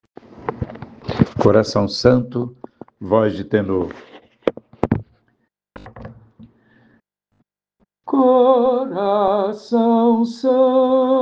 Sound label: tenor; coral sao francisco; missa comemoracao sagrado coracao de jesus